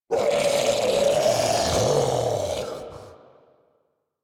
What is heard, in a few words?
Death; Grineer Ghoul Devourer